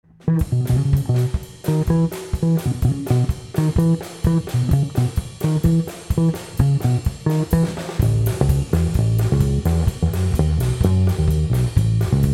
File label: Bass